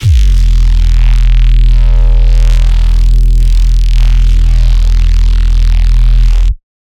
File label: Phase Plant, bass